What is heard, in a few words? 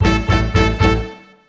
Hit